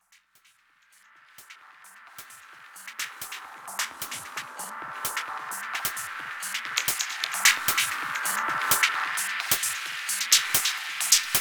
Ambience; Gali Ambience; The Legend of Mata Nui; Console Games; Game Music

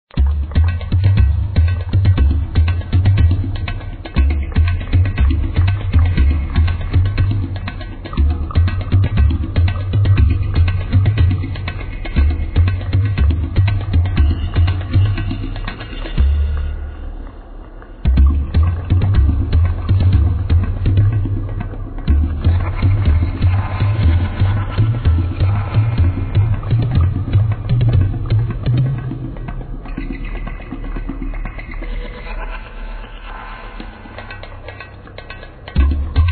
Drums; Piraka Attack Drums 2; Flash Games; Game Music

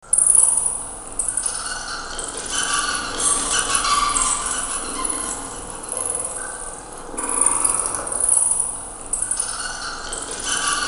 Bat Ambience; The Legend of Mata Nui; Console Games; Game Music

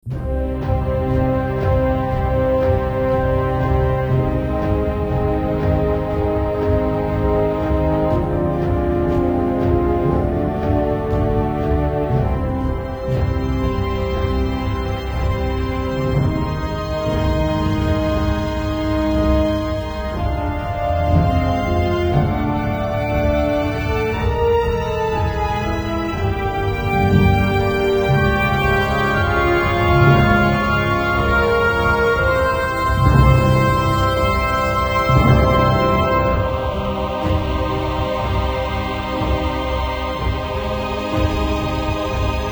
Menu, Game Music; Mask of Control; Console Games; Bio Pitch

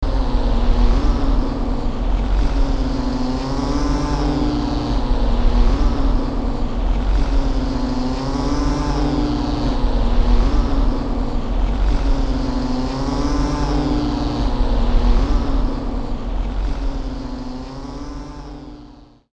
Wasp Ambience; The Legend of Mata Nui; Console Games; Game Music

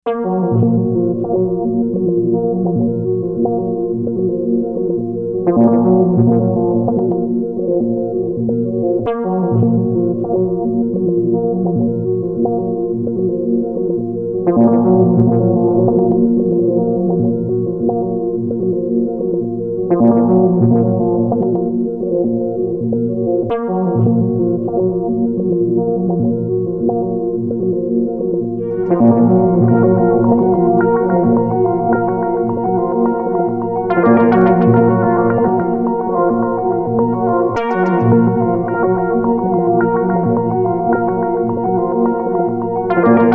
Ambience; Kopaka Ambience; The Legend of Mata Nui; Console Games; Game Music

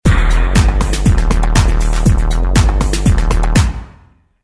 Pohatu Fanfare; The Legend of Mata Nui; Console Games; Game Music

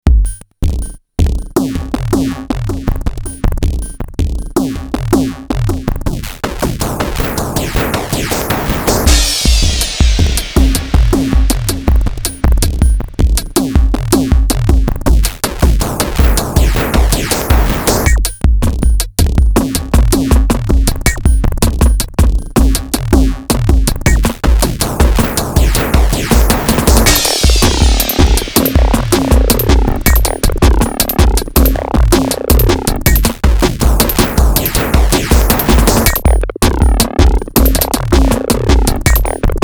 Pohatu Ambience; The Legend of Mata Nui; Console Games; Game Music

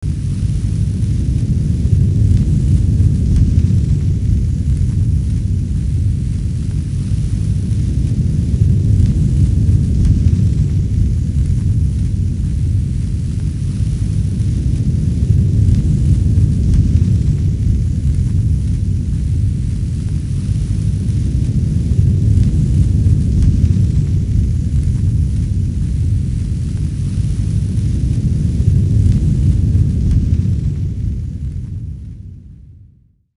Rumbling Ambience; The Legend of Mata Nui; Console Games; Game Music

